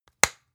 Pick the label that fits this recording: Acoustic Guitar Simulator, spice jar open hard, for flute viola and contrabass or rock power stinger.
spice jar open hard